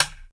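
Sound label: percussion, Drum & Bass; Ueberschall; Drum & Bass Resonance; 150 BPM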